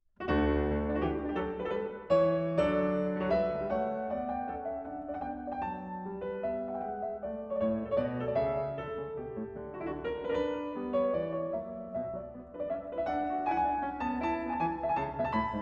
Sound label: Courante; Johann Sebastian Bach; classique